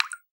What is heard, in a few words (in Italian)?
Goccia